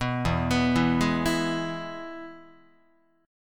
E-Major E, Guitar; arpeggiated; JGuitar

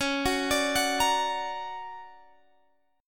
D Augmented Major 7th, chord

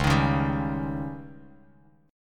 Db Suspended 2nd Flat 5th, Bass Standard; strummed

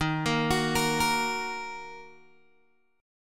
Eb Minor Eb Guitar Open G

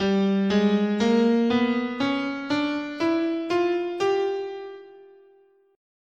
G Diminished Half Whole, scale

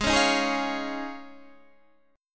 F Minor 7th Sharp 5th G sharp

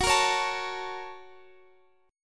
A sharp Minor Major 7th Sharp 5th

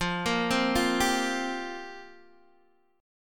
F Suspended 2nd Suspended 4th, Guitar DADGAD; x,8,8,5,8,5

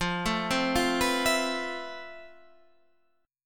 F Major Sharp 11th, Guitar, Standard; arpeggiated; JGuitar

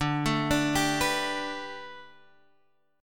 B Minor 7th Sharp 5th D, chord

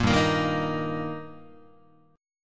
G Minor Sharp 5th G Guitar, chord; strummed; JGuitar

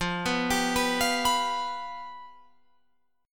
F Suspended 2nd Flat 5th, Guitar, Open F; Fsus2b5; arpeggiated

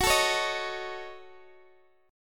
F sharp Minor 6th, Guitar Open G; chordshape; down

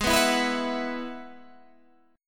Ab Minor 7th Sharp 5th Ab, Guitar Standard; strummed; 16,14,14,16,17,14

